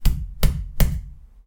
hit concrete wall; knocking

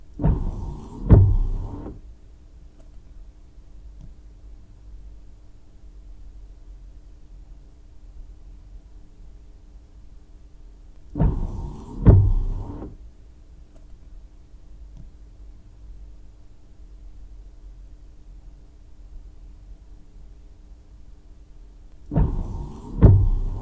windshield wiper; car

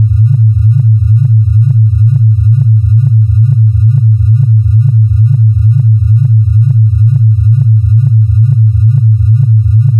ufo energy

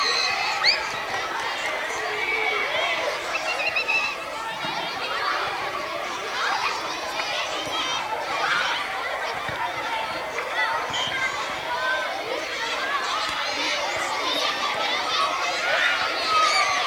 school ground kids; sports

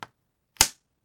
shogi

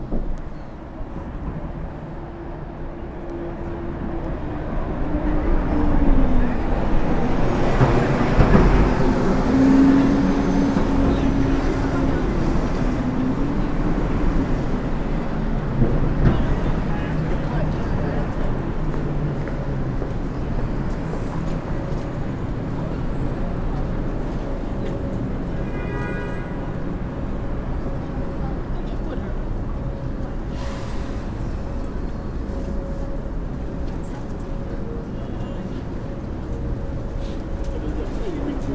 night

